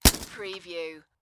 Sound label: Submachine Gun Uzi